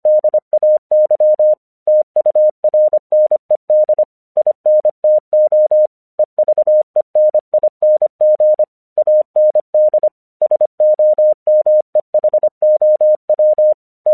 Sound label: English 25wpm